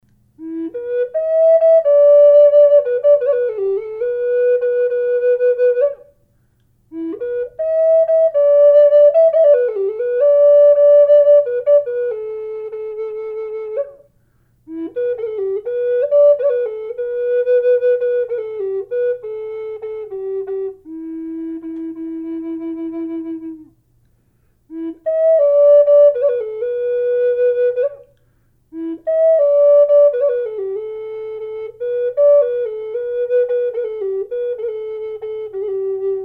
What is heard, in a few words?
falcon e minor, flutes